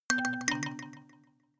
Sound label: notification kalimba delay third up